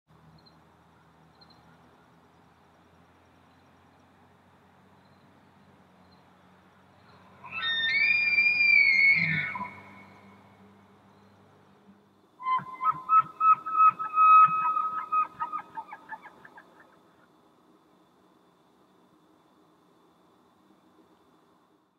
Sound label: Elk; واپیتی